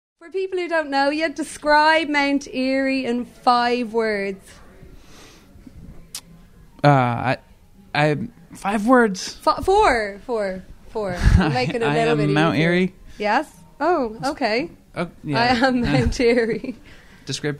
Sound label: Eerie